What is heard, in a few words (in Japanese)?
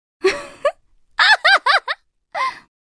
「フフッ…アーッハッハッハ！」; スキル（テレポート）